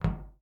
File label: Metal Footstep; Blastmorph Pinball; xbox series xs